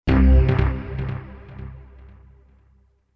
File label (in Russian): orkestr futuristichnij akkord visokij